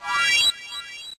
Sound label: Eerie Impulse